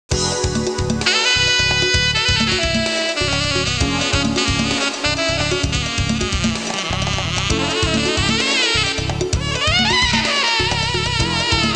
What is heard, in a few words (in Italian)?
musica urban